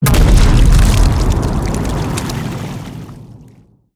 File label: skill big boom